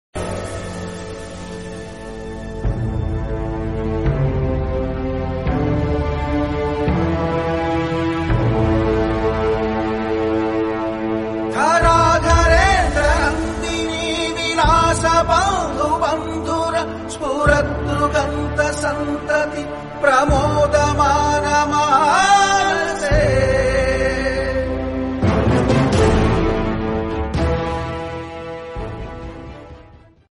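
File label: Telugu background music; kattappa; bahubali 2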